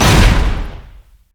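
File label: anchor chain collision; nautilus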